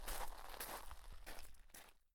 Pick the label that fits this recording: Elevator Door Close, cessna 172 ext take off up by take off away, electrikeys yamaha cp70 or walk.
walk